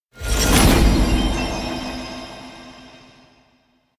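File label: electronic collective to individual; alumias; anemoi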